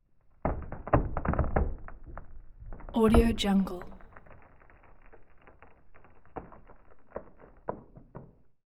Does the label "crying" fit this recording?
No